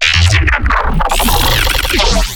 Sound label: glitch stab; Virtual Riot; Glitch Stabs